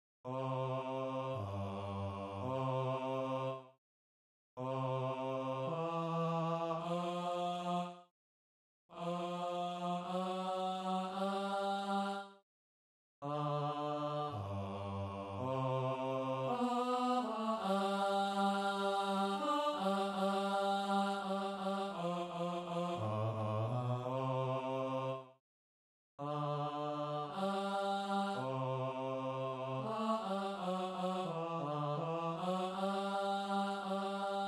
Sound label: Bass backing practice track